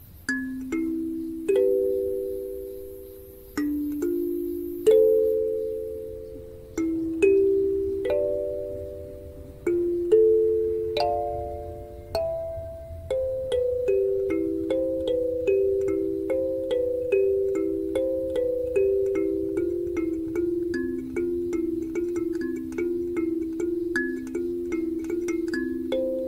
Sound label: kalimba cover